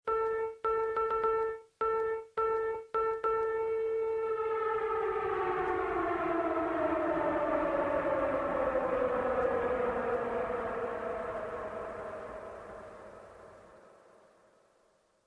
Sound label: sms ringtone